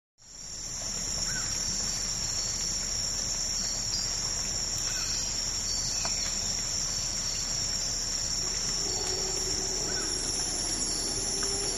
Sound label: Rainforest Ambience